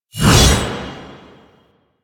Hammer Glass Whoosh C; Warframe; Weapons

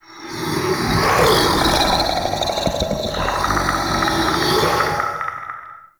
Male Vocal Idle; Characters; Enemy; Vocals; Mockeries

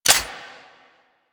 Sniper Reload Clip Out; DE; Warframe; Weapons; Skins; Venkra Sniper Skin

